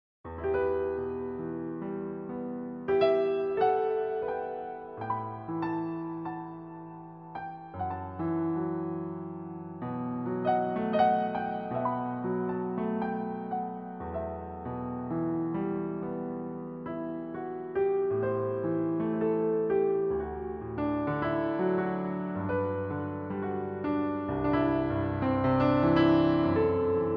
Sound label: piano